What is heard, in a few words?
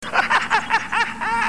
laughter; jokers